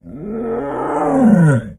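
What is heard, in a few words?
Tiger Growl Muted Yawn; big cats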